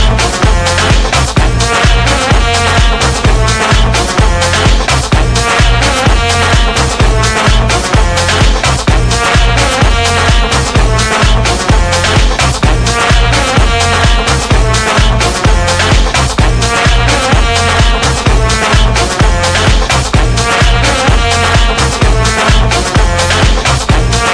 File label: Trompeta